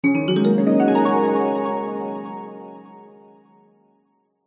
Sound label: fantasy magic glissando warm harp mallet bright ascending; zapsplat